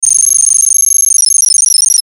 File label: Data Transmission Fast Number Beeps; Communication; Blastwave